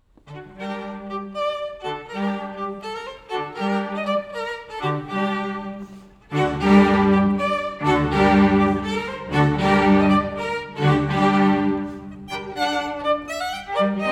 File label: jig